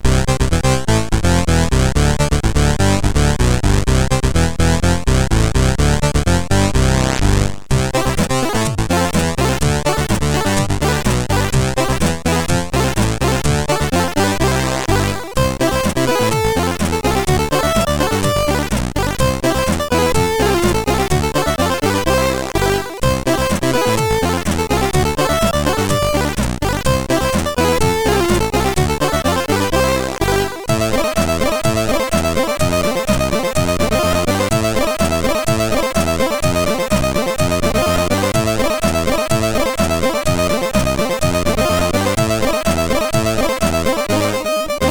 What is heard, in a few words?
Yeah Yeah Wow Wow, sid CSG8580R5; HVSC